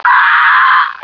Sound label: uuaahhh; stars; taunts